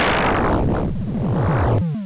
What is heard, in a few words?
Lightning